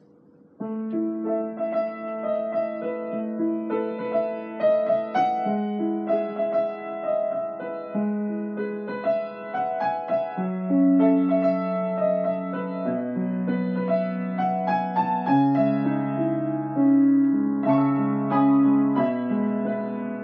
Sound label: Piano Version; Bakemonogatari Ep. 5; arr. by FireWhale